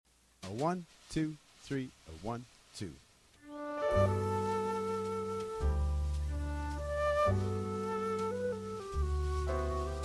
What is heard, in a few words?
ballad, Flute